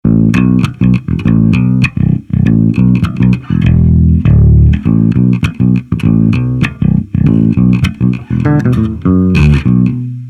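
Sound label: Mayones Patriot5 Bridge, bass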